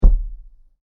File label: Bang On The Door, Door Knocking; Doors; Sound Effects:Doors; CraftedSignals